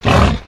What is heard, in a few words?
boar pain